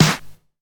treble heavy snare d sharp key, Royality free snare drum sample tuned to the D# note